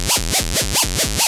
SCRATCH; JUST FOR FX

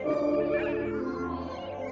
SYNTH PAD ETHNO 125 A, Sphere

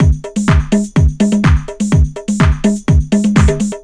HOUSE BEATS 125

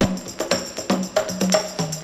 CONGA 119

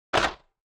Snares & Claps, One Shots

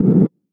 One Shot, Hits

